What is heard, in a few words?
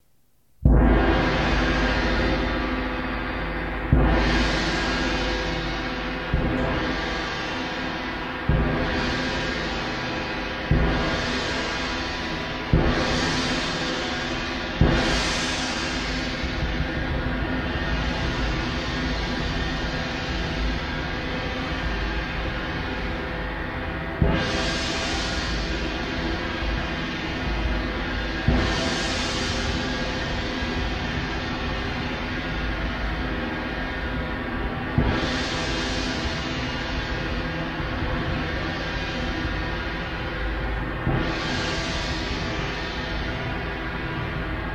Chinese Gong